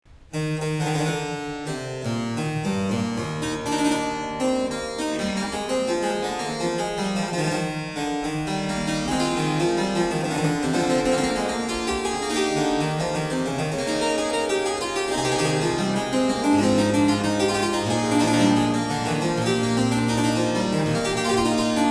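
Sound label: Goermans Taskin harpsichord Preludio; Haendel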